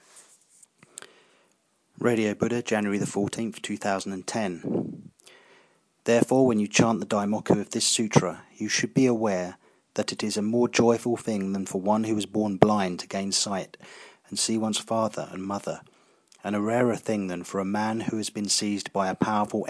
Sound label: chant the daimoku